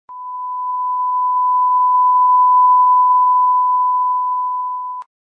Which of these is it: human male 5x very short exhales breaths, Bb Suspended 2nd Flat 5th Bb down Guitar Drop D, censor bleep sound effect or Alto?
censor bleep sound effect